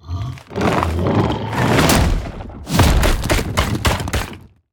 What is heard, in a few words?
release arm eat tower; crocs; abominor